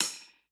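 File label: closed hi-hat; eddyflux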